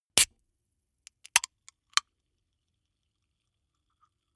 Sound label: soda can open fizzle; sport freizeit